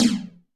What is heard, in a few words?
Tom Hi Phase, Alesis D4